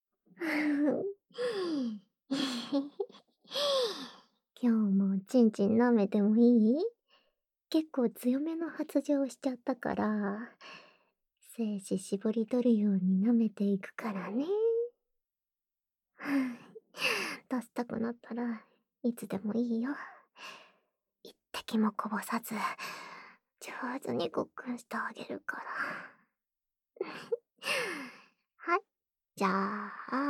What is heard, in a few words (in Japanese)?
フェラチオループ; オナサポ用